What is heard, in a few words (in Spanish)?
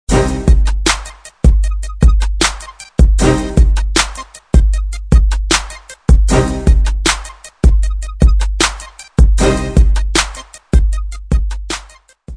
soldado instrumental